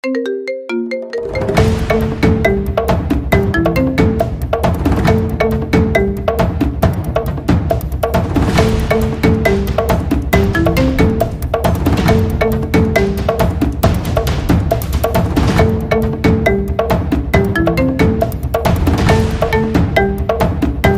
drums marimba ringtone; gear 5 luffy x joyboy; one piece

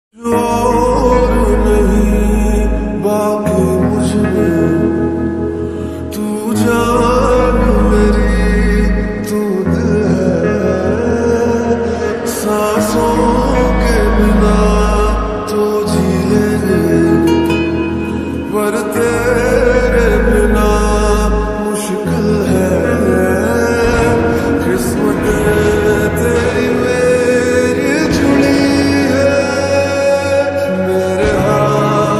slowed reverb song ringtone

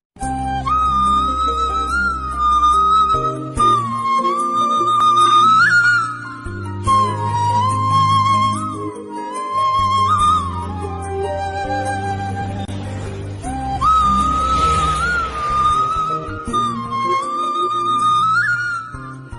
love bgm love ringtone love bgm tamil love bgm; ghilli; thalapathy vijay

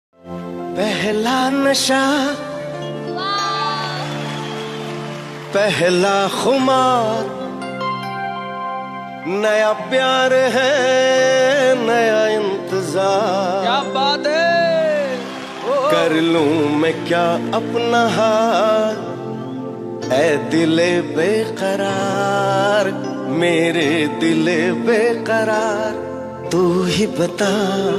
song singing

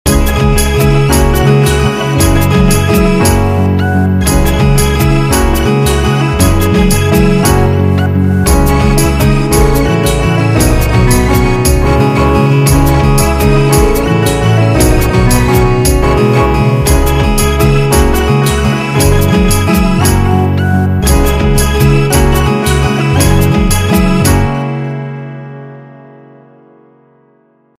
mobile ringtone instrumental ringtone

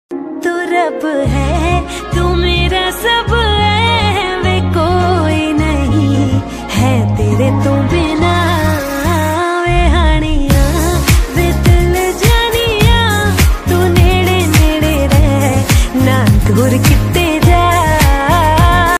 female version song

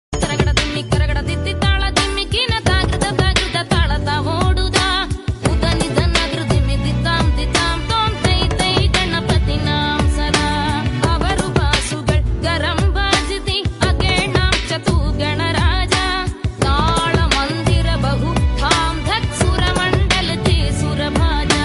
remix ringtone bgm tone

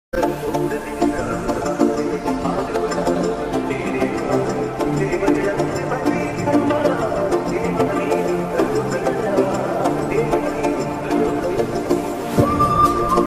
mangal murti ram dulare song

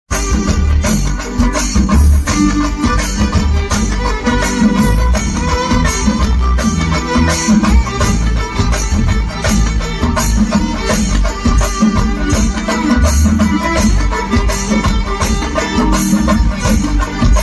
violin fusion, singarimelam

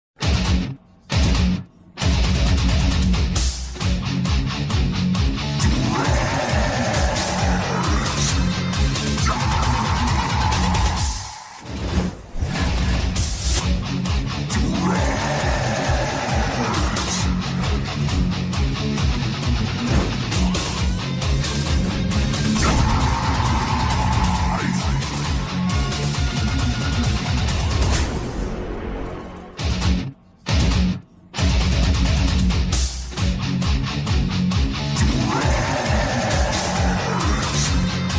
villan theme background score bgm; mersal daniel villan theme; tamil

